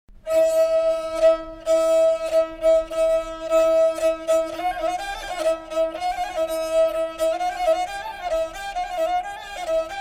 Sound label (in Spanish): musica tradicional espana